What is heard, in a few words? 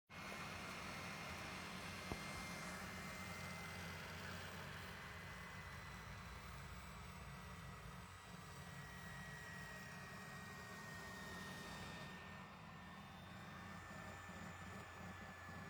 server noise